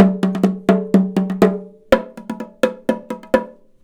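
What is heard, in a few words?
timbales, Indian Traditions; USB Soundscan vol.45; AKAI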